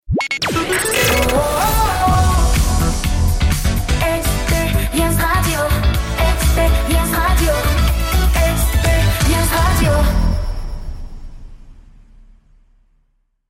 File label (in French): Radio Jingle Chante Nu Disco